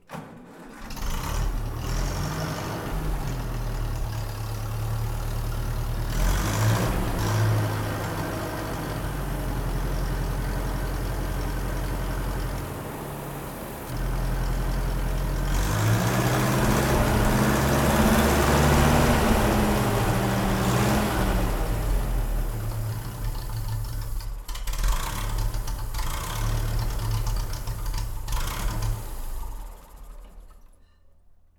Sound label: Ferguson Tea 1952 Petrol Engine